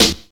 Clear Steel Snare Drum C# Key, Royality free snare drum tuned to the C# note; snare drum samples